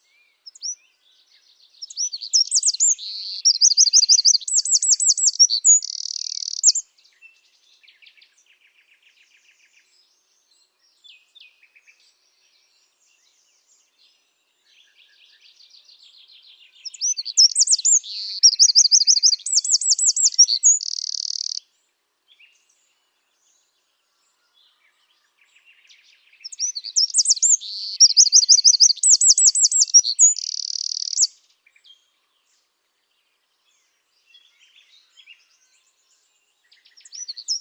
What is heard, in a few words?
Troglodytes troglodytes; SETE